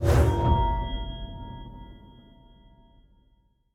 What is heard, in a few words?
end portal eye place; Minecraft; Votive World